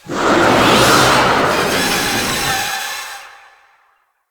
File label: Infested Ancient Death; Characters